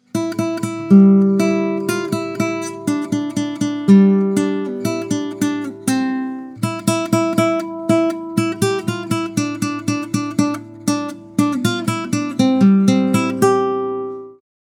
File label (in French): guitare; il etait un petit navire